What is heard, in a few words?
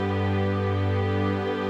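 Pad G major, Orchestral; Sonic Foundry (Sony Creative Software); Orchestral VOL-2; Pads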